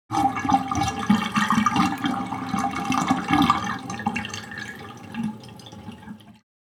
Bathroom sink draining water

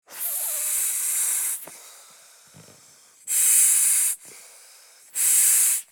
Rubber ring inflation blowing; inflatable

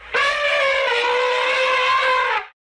Elephant trumpets medium distance

